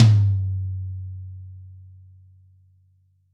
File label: big room tom g key, Royality free tom drum sound tuned to the G note. Loudest frequency: 431Hz; tom drum samples